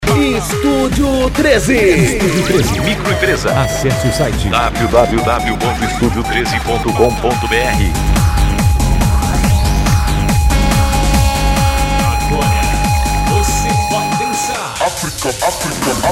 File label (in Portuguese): dance sertanejo remix eletro funk e pancadao; Studio 13